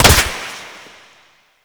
Flak Cannon Proj Hit; Grineer; Weapons

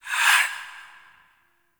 War Pact Buff Start; Warframe; Priest

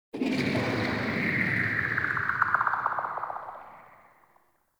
Interior Cave Texture Rock Movement; Ambience; Indoor; Warframe; Infested Eris

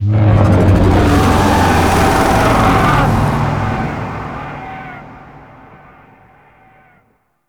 Void Dragon Vocal Distant Idle; Duviri; Warframe; Citrine's Last Wish

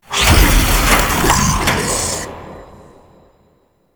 Cursed Chest Unlock; Warframe; Duviri Murmur; Cave Combat Encounter

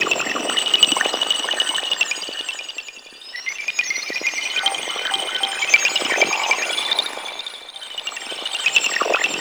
Sentient Cave Anemone Loop; Warframe; Tau; Ambience

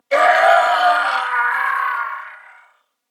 Death Corpus Venus Male, en; Dialog; Barks; Battle; Warframe; CorpusVenusMaleB

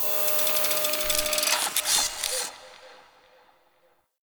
Mods Station Anim Off To On; Interior; Ambience; Warframe; Nineteen Ninety Nine; Tailor Shop

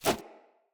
Corrupted Sproutfolk Grunt Foley Throw; Enemy

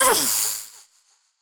Girl Pain L; Warframe; Zariman; Skitter Girl

